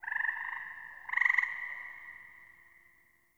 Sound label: Forest Distant Frog Call Resp; Warframe; Grineer